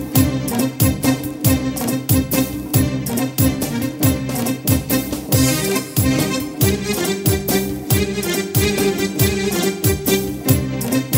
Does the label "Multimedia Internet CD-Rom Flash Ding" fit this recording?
No